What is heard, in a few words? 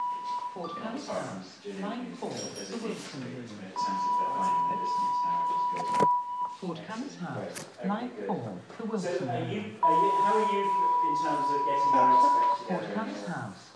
interim holding music